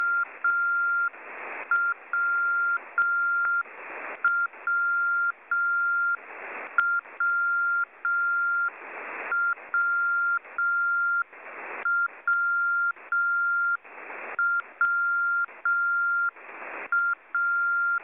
russian air force tu 95ms bear aircraft aka w beacon